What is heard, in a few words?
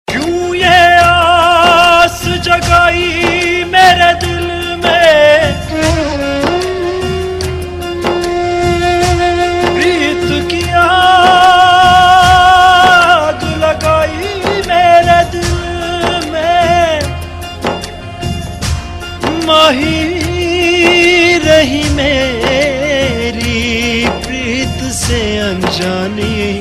Sad Song; Sabki Laadli Bebo; Amrit